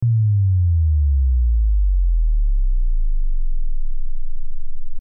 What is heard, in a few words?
LFE Test Tone Osc, Lfe Test Tone Oscillator Log Sweep 10Hz-120Hz; Lfe Test Tone Oscillator Log Sweep 120Hz-10Hz 5 Sec -12Db Log Sweep; Blastwave